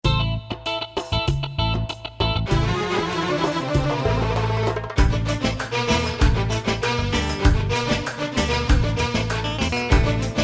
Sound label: Arabic Pop